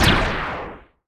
Homing Projectile; PRJ Homing Projectile 01

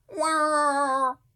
Roller Cry; Fung Roller

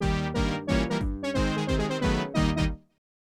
Level Complete; Pac-Panic; CD-i gamerip 1995

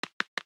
Mantis Steps Back; Hollow Knight

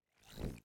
Battery Plate Light-Off Rev; viewfinder

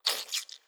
Sponge Hit; Legend of Keepers

